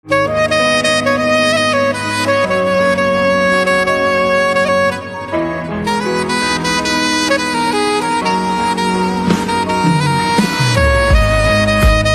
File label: Saxophone